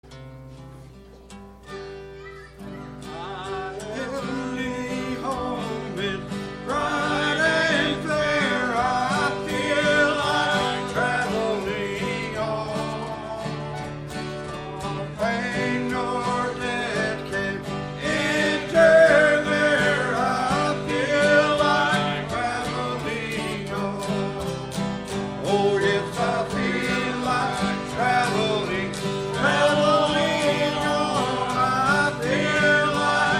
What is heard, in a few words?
Choir; I Feel Like Traveling On